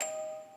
musicbox

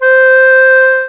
Flute

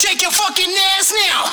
vox

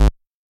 bass

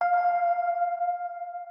organ

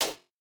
breakbeat

